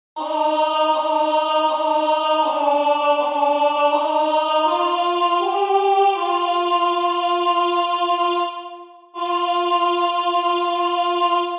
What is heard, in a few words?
Alto; Funeral music of queen Marie